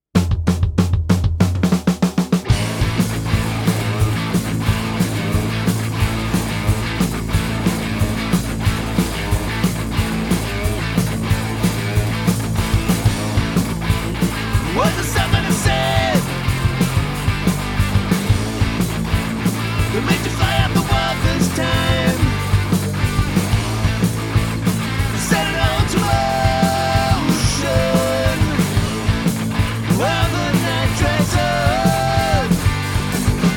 w vocals

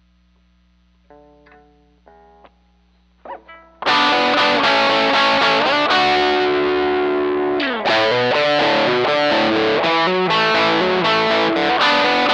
Guitar

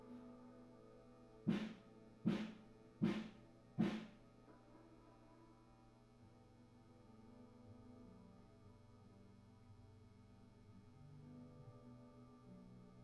Rode NT1A Guitar (room)

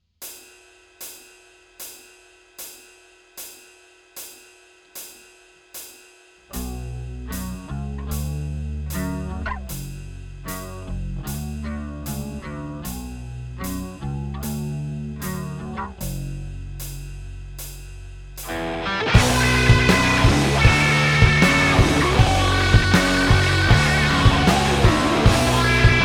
Click; Pre Pro

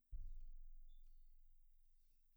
BD 2 Drums

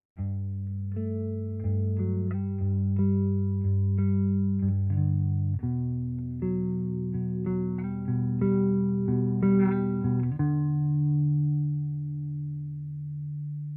D112 Bass

